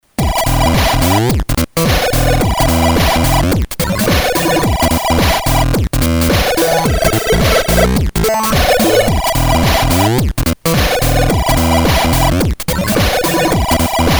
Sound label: hip hop beat lsdj